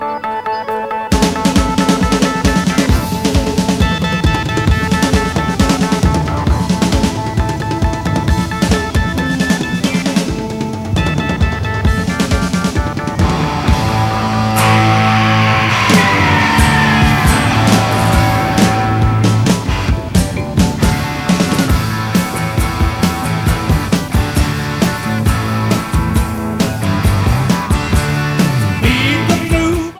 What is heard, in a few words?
Drum Solo